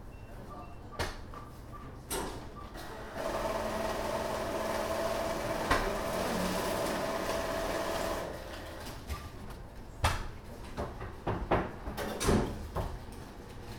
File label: bank, ambience; NS Studios - ultra sound collection